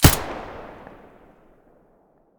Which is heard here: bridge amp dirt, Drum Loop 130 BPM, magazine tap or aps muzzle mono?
aps muzzle mono